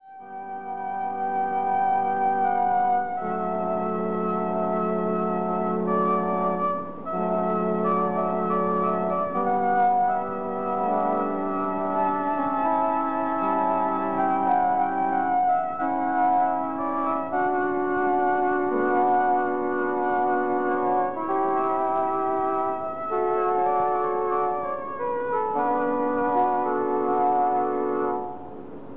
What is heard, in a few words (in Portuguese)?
orgao